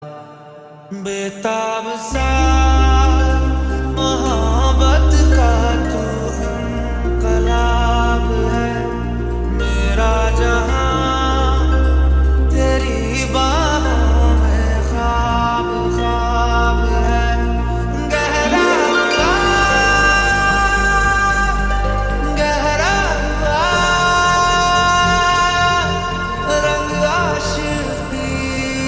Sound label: Lofi, slowed reverb; Dhurandhar